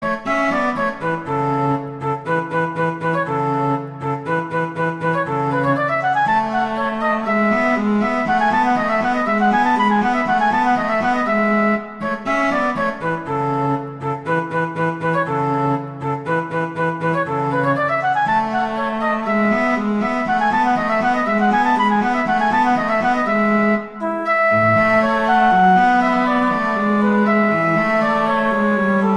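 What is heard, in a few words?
Duet, horn; Mozart; Duets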